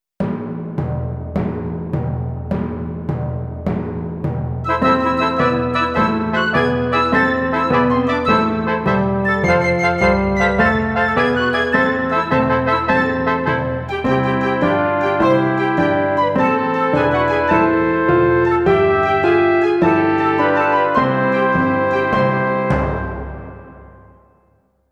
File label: Sea Song